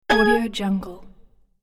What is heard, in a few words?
button game action menu